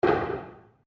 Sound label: Wood On Wood Table; Material components for constructing more complex sounds; Sound Effects:Materials